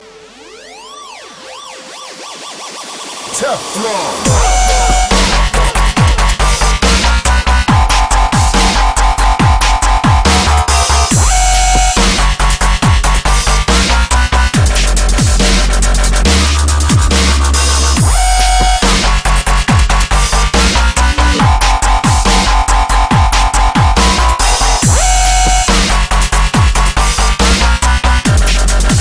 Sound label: dubstep